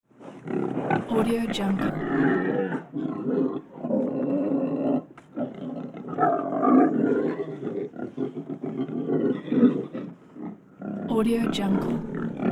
pig grunts and squeals; hollywoodedge

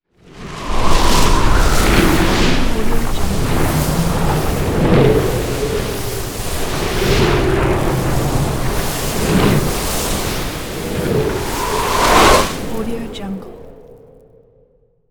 elemental sand cast; soundmorph

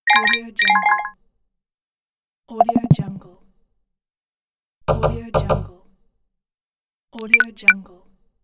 pleasant cell phone sms

